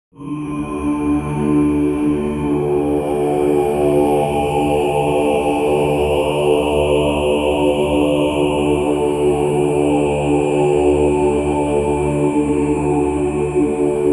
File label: haunting gregorian chant